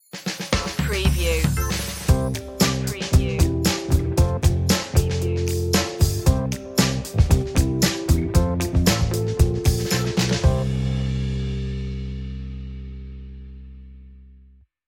relaxing groove

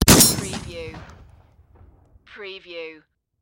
Shotgun SPAS-12 Semi; sound effects bible

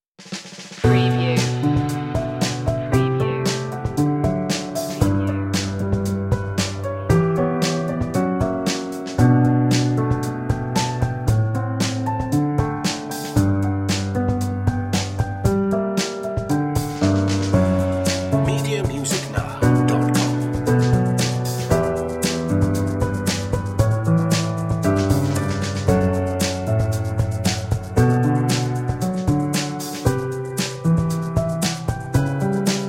sweet piano drums

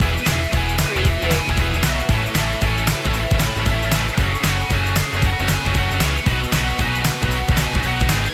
rock me indie loop

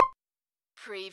minimalist

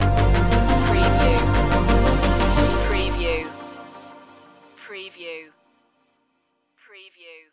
liquid stinger

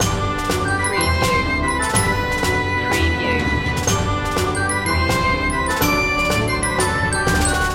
wartime spirit loop

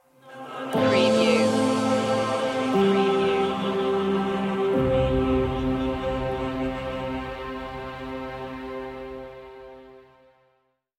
a new movement stinger

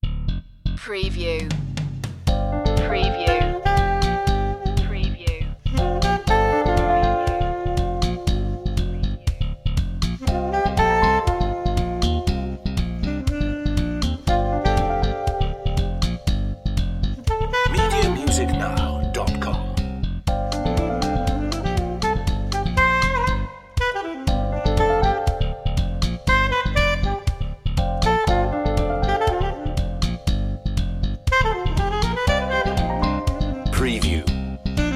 slap bass

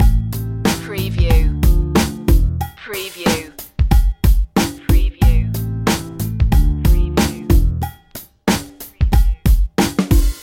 hip hop from cuba loop

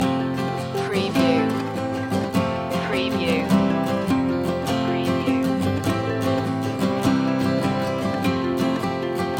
the nu-folk tune loop

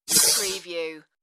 computer interface message

